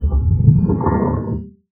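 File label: glissando, special fx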